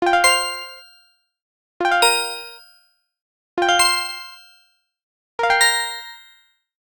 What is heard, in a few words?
Arcade Click Positive